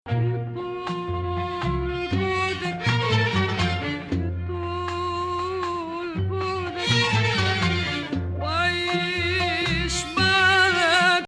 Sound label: Sikah Beladi, maqam; Rast